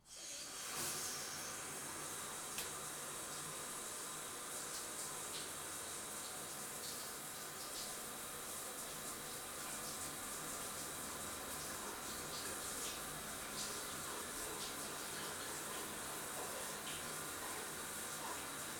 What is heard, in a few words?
Shower On Run Off; Fairlight Sound Lib